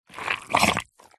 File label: foley liquid wet squelch bubble gungy slimy